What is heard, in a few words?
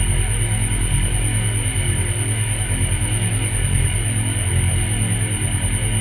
Synth Loop; Sound FX